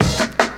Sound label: Old School Break, Drums